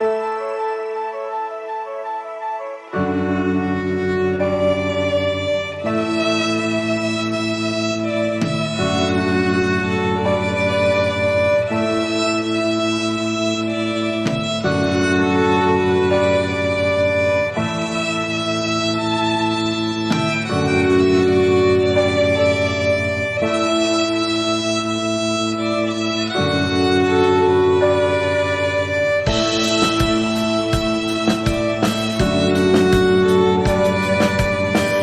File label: Drum Guitar Violins Cover